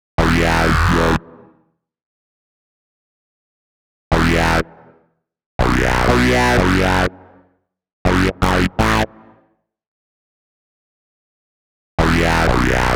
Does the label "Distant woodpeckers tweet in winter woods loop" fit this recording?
No